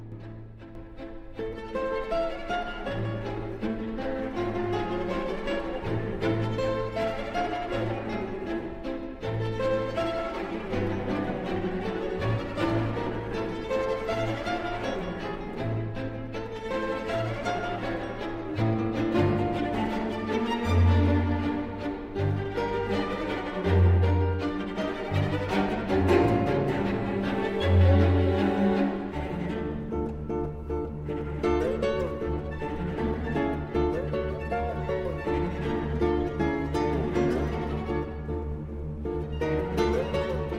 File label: Concerto for guitar and strings, Andante; Concierto de Aranjuez